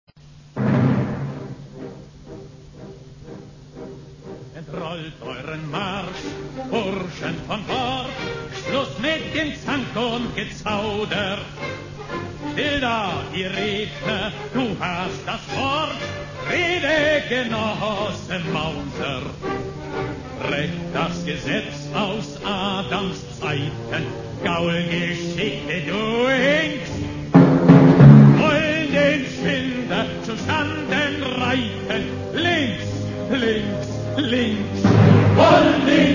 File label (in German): Marsch